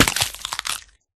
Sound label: egg break, turtle egg; mob; Minecraft